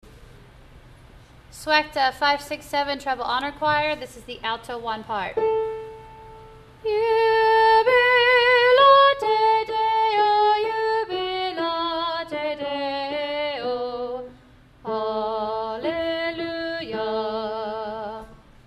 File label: treble alto